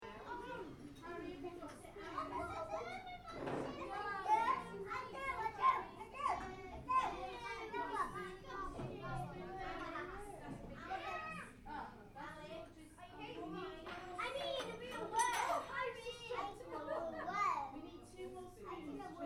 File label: Party, Children, Small: Lunch Ambience; Cafeteria Sound Effects; Cafeterias (Sound Effects:Backgrounds); Interior kids party lunch; Stuart Duffield